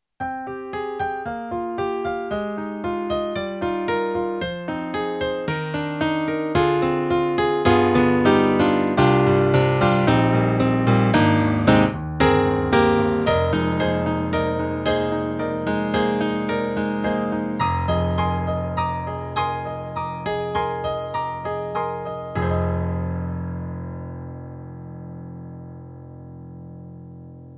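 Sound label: EDIROL SD-80, Stereo Piano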